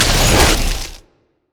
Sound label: ice shard; LoL; lissandra